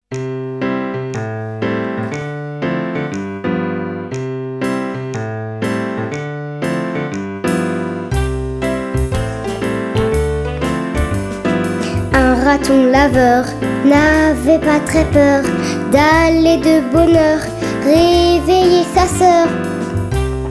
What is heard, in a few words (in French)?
Dans la foret chant